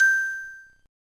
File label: Error; LG; B470; Zoom